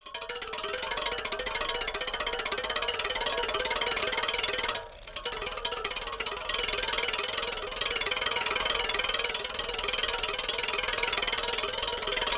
Bicycles, Wheel, Broken Spoke, Pencil on Tire, Medium Speed; lilesound